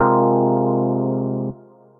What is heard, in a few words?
Kush Chords, Piano & Keys; Ableton; Factory Packs; Beat Tools